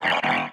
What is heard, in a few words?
attackVoice swordSwing; enemies